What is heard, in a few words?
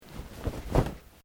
Clothing Kimono Sleeve Move; Movemement sounds for various articles of clothing; Dressing; Human